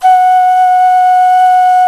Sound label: D OCRNA, CLAYFLUTE